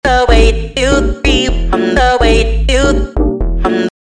beat builder

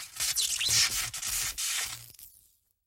high voltage spark

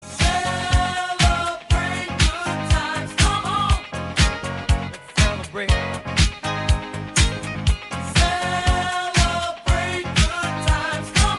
celebration

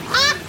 ahh

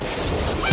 lightening strike

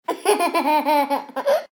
baby laugh

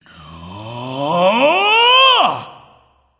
hooooooooooooooo

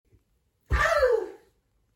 eaughh

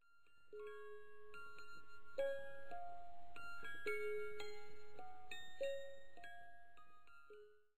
lullaby music box

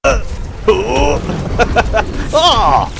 grunt

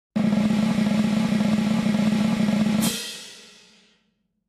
drumroll

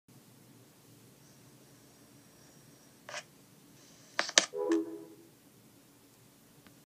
FaceTime hang up noise

